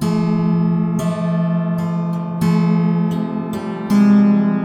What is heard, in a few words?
Detune, Piano; Piano Destruction